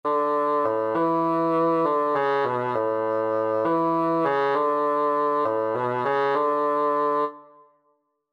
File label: P5 bassoon; Range identification